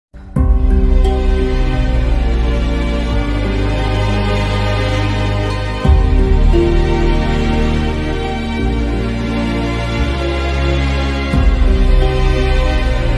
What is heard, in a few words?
climax emotional bgm ringtone; sridevi soda center